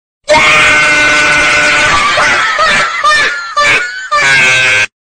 dog laugh; meme

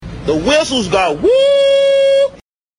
whistles go woo

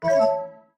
slime plort; slime rancher

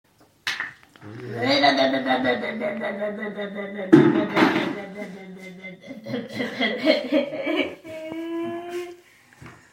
bleh bleh bleh bleh

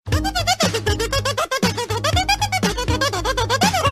kazoo

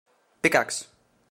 pickaxe

